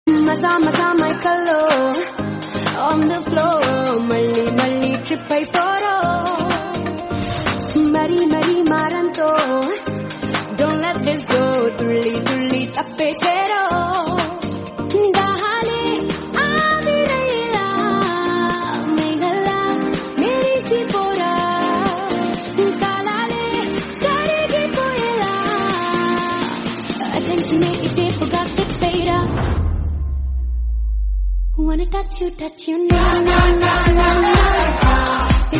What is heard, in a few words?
Song Ringtone